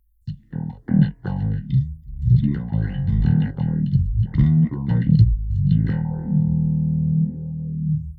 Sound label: bass; Morph EQ; SPREAD TALK